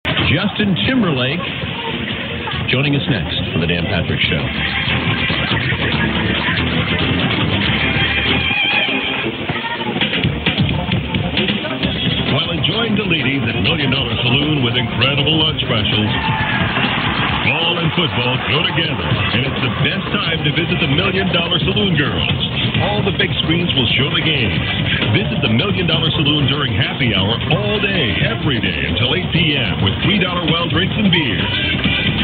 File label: 1700 long, mw dxing; kklf